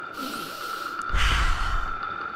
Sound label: deep breath